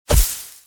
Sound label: pinata impact